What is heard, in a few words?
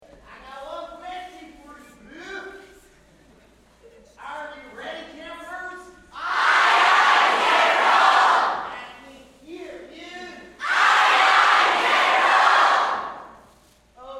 Cheer